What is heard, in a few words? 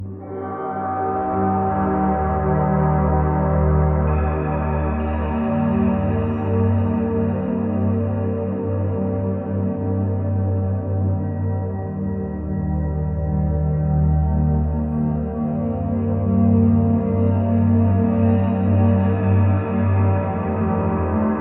174 Hz